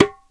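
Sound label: perc darabuka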